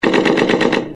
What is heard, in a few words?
Machine Gun